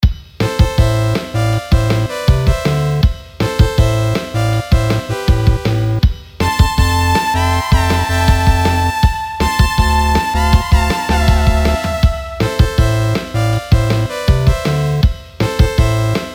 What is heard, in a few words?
8Bit Action, Soundtrack; Bogart VGM